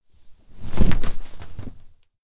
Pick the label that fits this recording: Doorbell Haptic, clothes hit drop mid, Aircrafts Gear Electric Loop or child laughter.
clothes hit drop mid